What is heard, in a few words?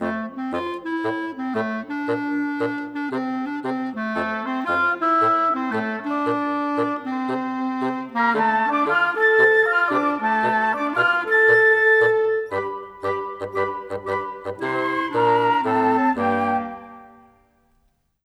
rock pop winds